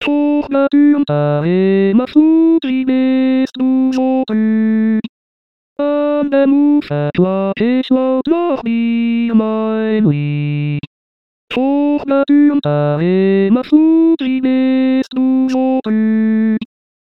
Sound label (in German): Zigeunerlieder ténor